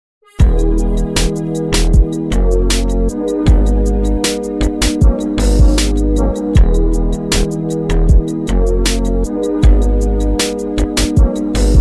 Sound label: romantic beat